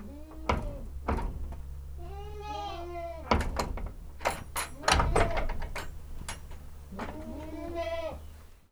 kecske olajtomekeges; állathangok; paraszt udvar; házi kecske

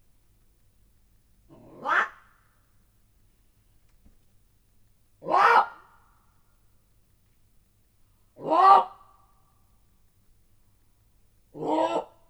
öt kiáltás, mocsári hiúz; miskolci zoo 2009

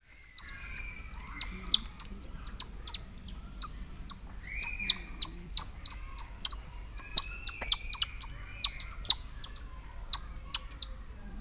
aranyoroszlánmajom ezüstös selyemmajom; szegedi zoo 2011

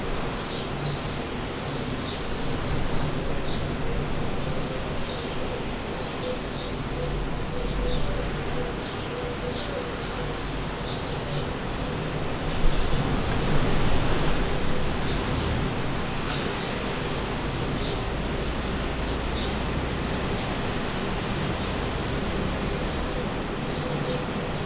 furdo ablaknal, szel zart helyen; hortobagy

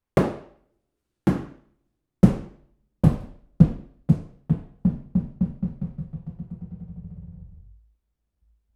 pattogas, tomor gumilabda; perkupa

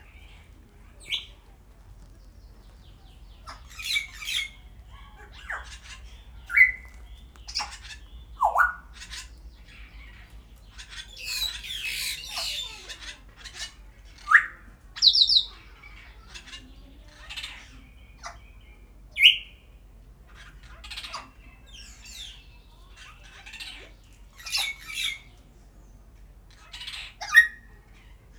jakó csőrícse, jákópapagáj; szegedi zoo 2011

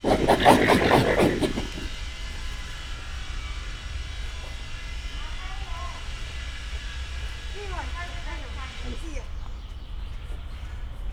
kisebb civakodás, rozsomák; szegedi zoo 2011

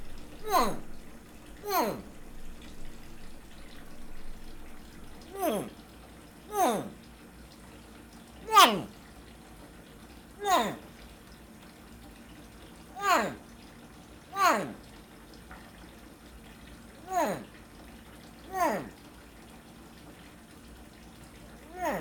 a vízben is így kérnek segítséget a kicsik, tompaorrú krokodil; pecsi zoo 2017

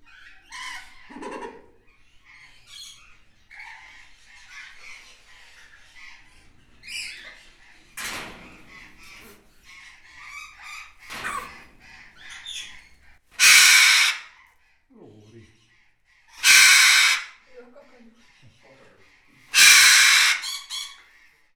a végén hangosan megszólaló, lorifélék; debreceni zoo 2012

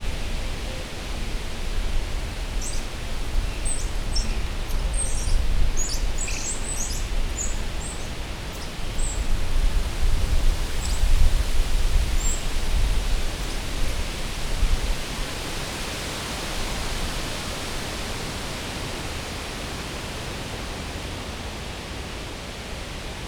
alig hallható az zúgó széltől, közönséges mókusmajom; Győri Zoo 2014

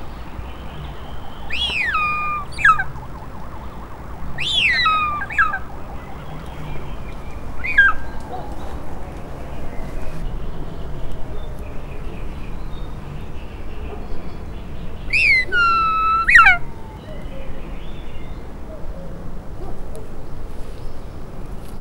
kígyászölyv; szegedi zoo 2011

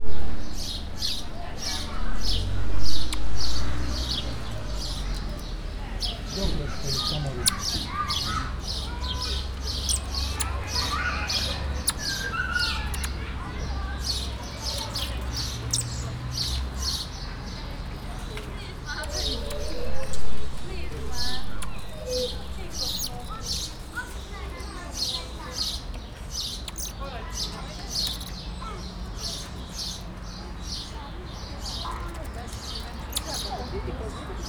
középen ad magasabb hangot, balra veréb, gyapjasfejű tamarin; kecskemeti zoo 2016

